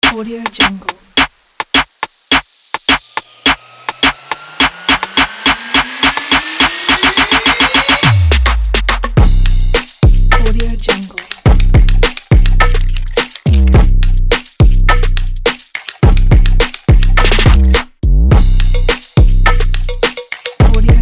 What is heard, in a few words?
rnb